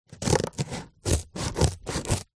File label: office scissors cutting cardboard